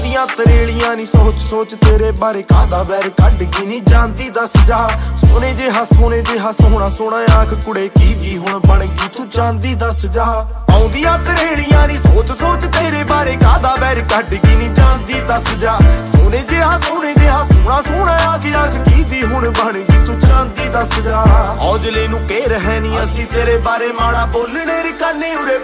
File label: remix sweet ringtone, bollywood ringtones